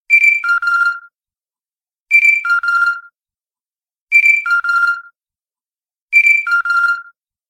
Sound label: Holocall; SWTOR